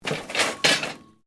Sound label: METAL CRASH SMALL